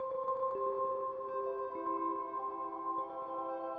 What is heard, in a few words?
eurorack